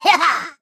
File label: ult